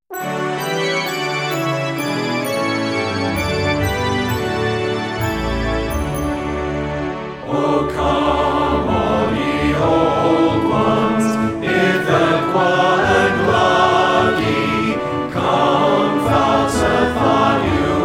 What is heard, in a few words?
Choir; christmas